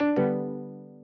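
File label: Instant Messaging Error On Connection; oxygen sounds; plasma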